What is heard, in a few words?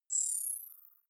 zoom out light, signale; kommunikation